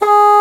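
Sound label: Bassoon mf G#2; Digital Sound Factory Virtuoso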